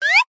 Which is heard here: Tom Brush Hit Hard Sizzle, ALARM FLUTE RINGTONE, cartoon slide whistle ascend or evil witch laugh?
cartoon slide whistle ascend